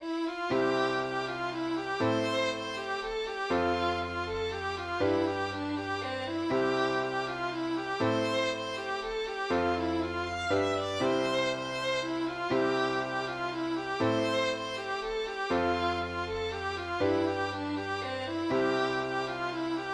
mazurka

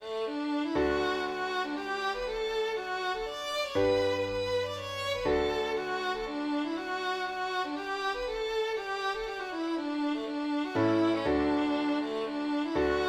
mazurka

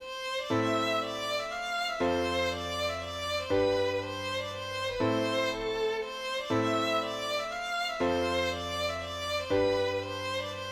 mazurka

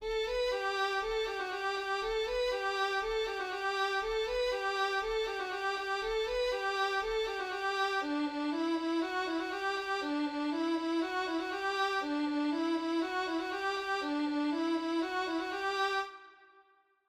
scottish, vl1

